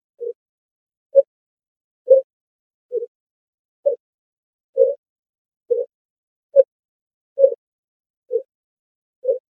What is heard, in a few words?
micronic murmur